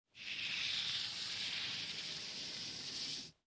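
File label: sounds of playing checkers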